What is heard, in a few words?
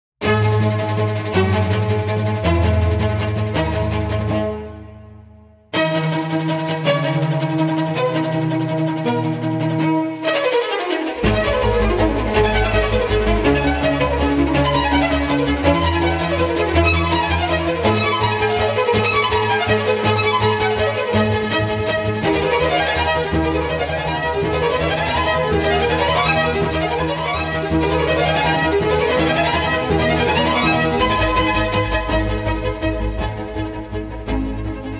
Vivaldi Estate Strings, Stereo Out